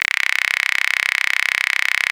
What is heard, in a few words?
Buzzhawk C3, Synth Hits; Zero-G; Soundclash Nu Dance Electro; Acidized